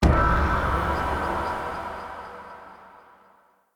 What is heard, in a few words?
Puzzle Rune Hit C; Circade Community